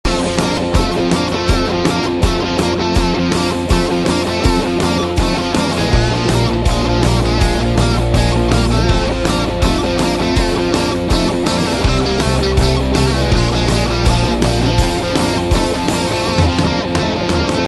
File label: Cover Band